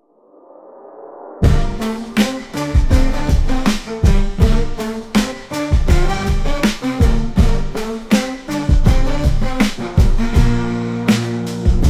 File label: interlude